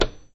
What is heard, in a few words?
Confirmed; openclonk; UI